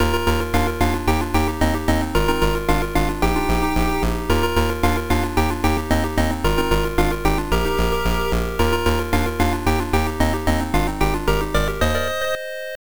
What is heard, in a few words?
Round Clear; bonk s adventure turbografx 16